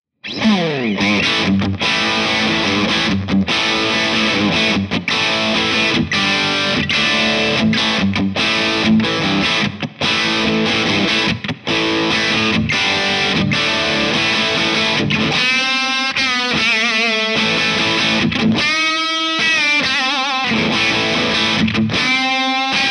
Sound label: characteristic sound; jensentone